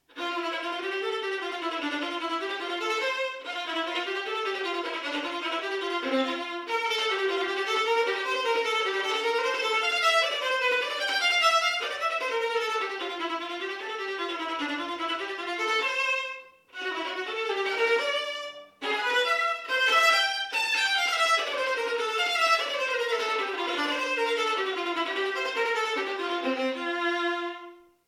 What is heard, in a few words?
Melody, Violin; Fitzpatrick; Violin Melodies